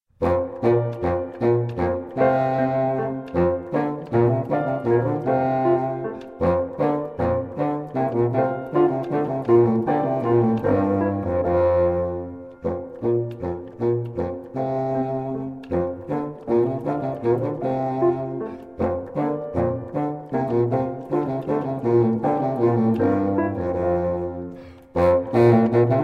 violin; Variations for violin on themes of Tartini; Moderato

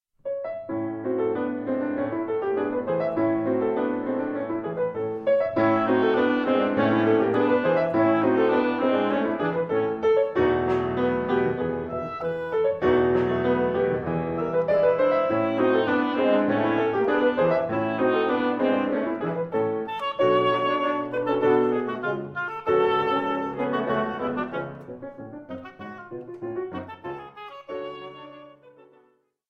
Trio; in A minor; Finale

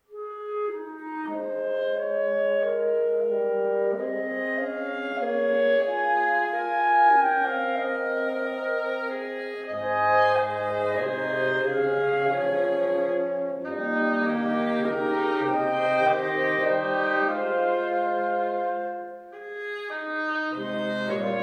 Woodwind Quartet; Mvmt IV; woodwind ensemble

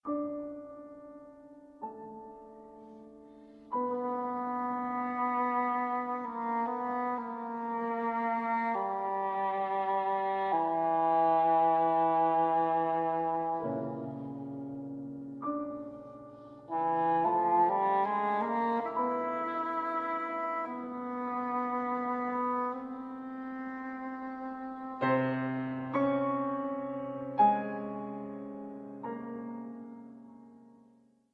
Suite Recitative; Mvmt 3

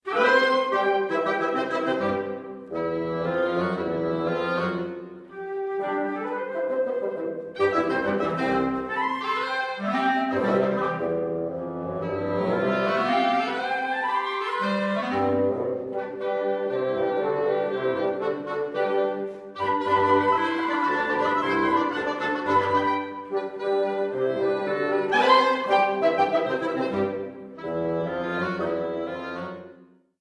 Woodwind Quartet

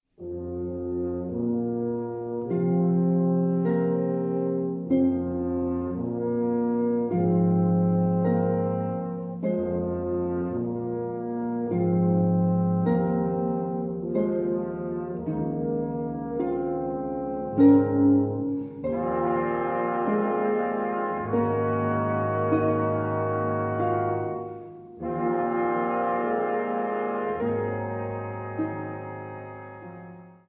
Concertino for Harp and Brass Quintet Adagio

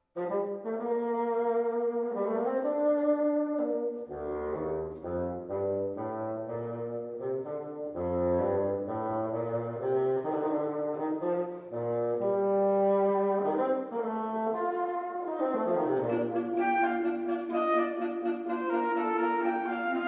Quintet; in Bb Major, op.100, no.6, Mvt 1 Allegro

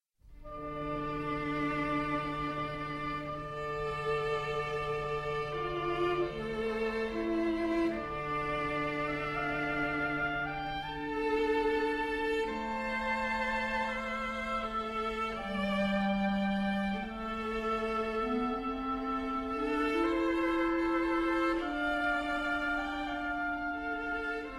Suite for Oboe, Clarinet & Viola Lento Religioso

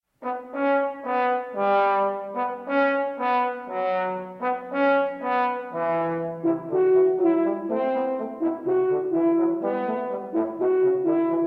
Harp and Brass Quintet, Allegro con brio; Concertino for Harp and Brass Quintet

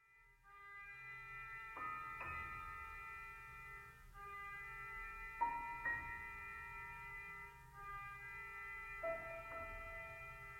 Concertino for Piano and Brass Quintet Largo

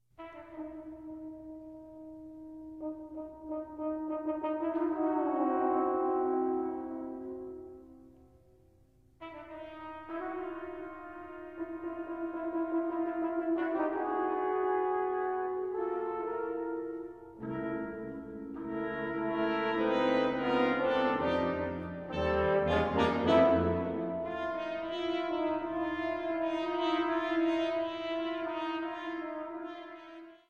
Brass Quintet; No. 1; mvt. 1

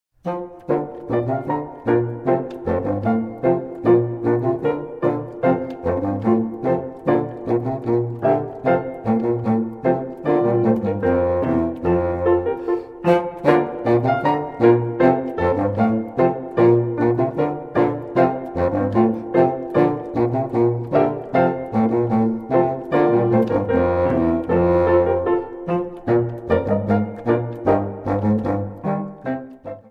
violin; Variations for violin on themes of Tartini; Allegro

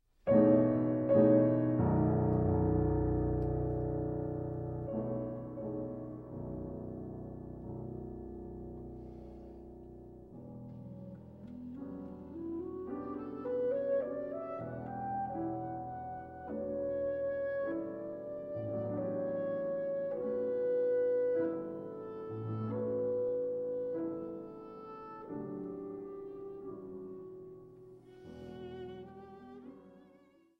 Trio Lullaby; Celebration

